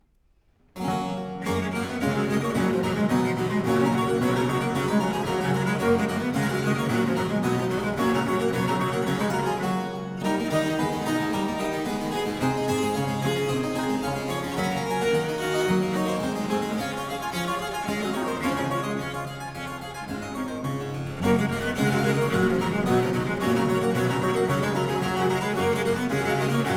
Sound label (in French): Trio II en Sol Majeur - IV. Presto; Telemann; Trios & Quatuors; La Reveuse